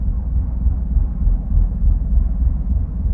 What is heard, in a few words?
engine sub; sniper mode sfx; World of Tanks Blitz